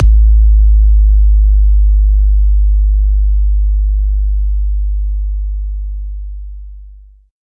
Bassdrum; Mutekki Media; Vengeance Electroshock Vol.2

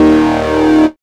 HARD Eb2; dubstep; Jack Sparrow; Deep Dubstep Progressions

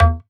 Percussive

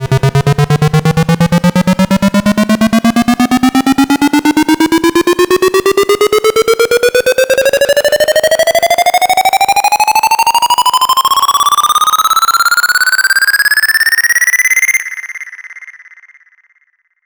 FX Uplifter

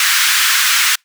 FX Impact

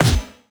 Snare, Dubstep One-Shot Drums

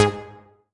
Synth Shot G#; Mutekki Media; Vengeance Electroshock Vol.2

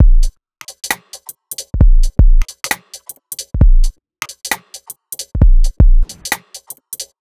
Bpm133 Ginger Break, Dubstep Drum Loops

